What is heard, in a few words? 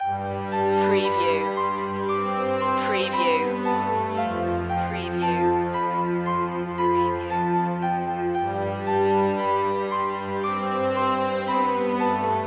xmas loop